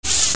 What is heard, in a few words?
FRICTION